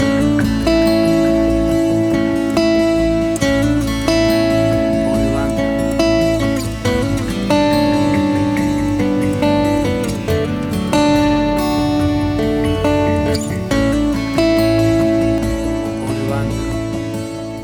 Acoustic Guitar Moods

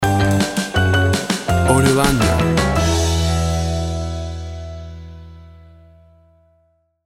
Woody in Tow Stinger

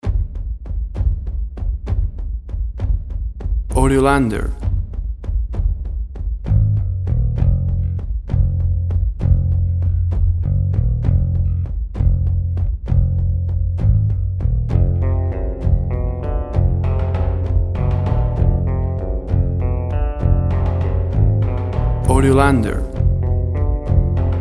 deep suspense

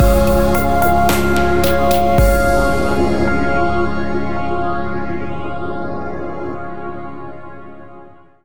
A Trip Through Space Stinger

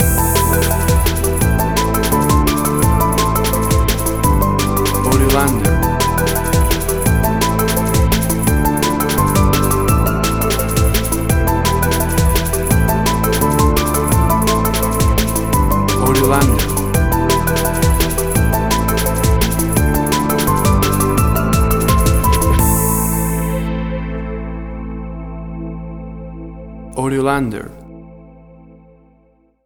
Bouncy Drum and Bass Rhythm

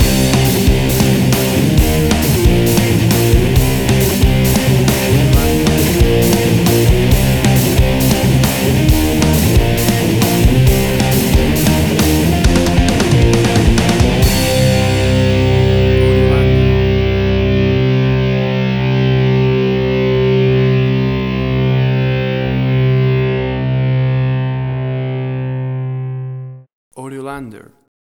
Big Dirty Rock

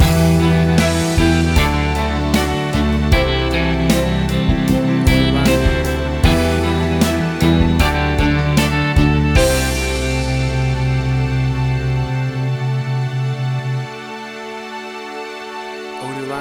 Anthemic Pop Rock

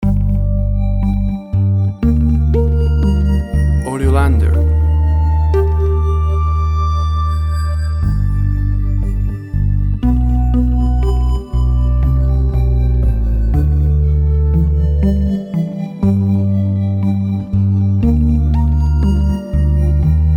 Game Music game menu

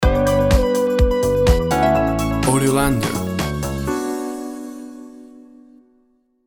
Upbeat Grooving Funk Bass House Stinger

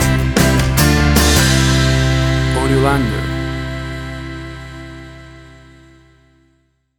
60s Organ Rocking Stinger

